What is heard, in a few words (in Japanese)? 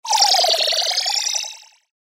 テレポート; モノラル; 魔法効果音